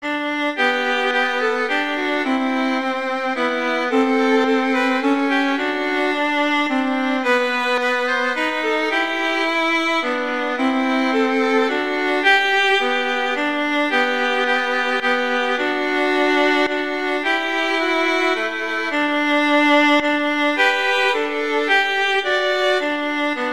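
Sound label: We Wish You a Merry Christmas, Violin Duets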